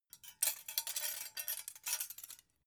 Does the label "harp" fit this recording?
No